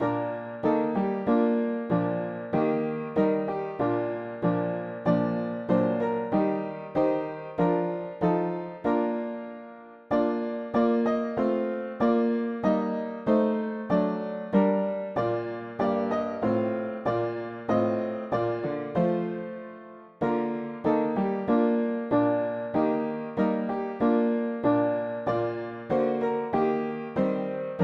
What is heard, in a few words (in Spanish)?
mono piano; hymns; Himnario pequeno